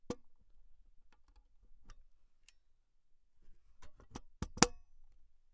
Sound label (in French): OUVERTURE FERMETURE CAPOT KINAX JUNIOR